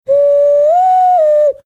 doing loon call

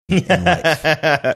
chuckling

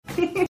quick giggle

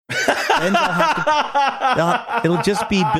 having good laugh

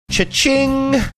cha ching

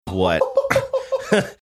funny laugh

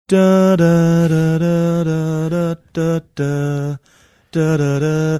singing portion of theme

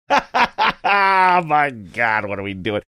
hahahaha my god what are we doing

